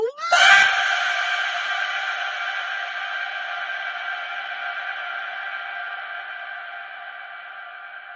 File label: scream and disappear; mario